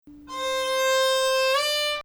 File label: Bayati Octave; not fully realized until #27--just basically 7 to 8